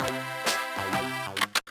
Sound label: Switch